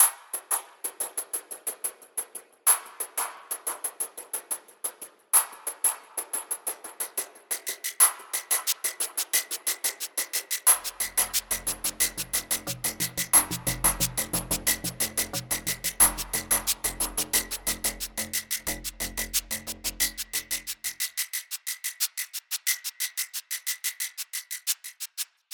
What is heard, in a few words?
hectic background rhythm